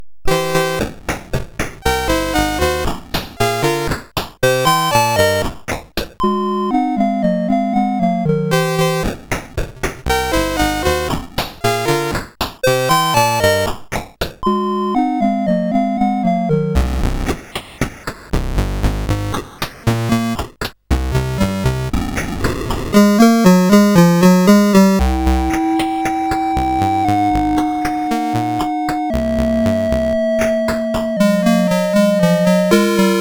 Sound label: Slam Dunk, sid CSG8580R5